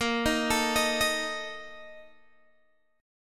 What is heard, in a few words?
Bb Major 7th Suspended 4th, Guitar, Open G; arpeggiated; x,15,13,14,16,13; BbM7sus4